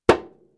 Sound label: Djembe